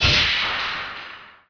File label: armor pickup; PC Gamer IT CD 41; Daikatana; items